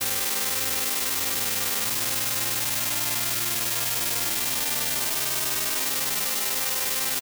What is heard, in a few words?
full strings AM white nonstat